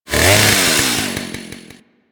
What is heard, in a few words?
Ghoul Sawman Rev, Attacks; Warframe; Grineer Ghouls